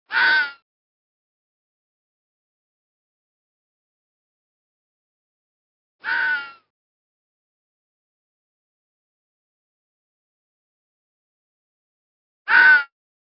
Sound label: Fox cry